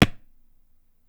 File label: tabla; Dirt-Samples